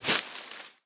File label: reel Bounce; netent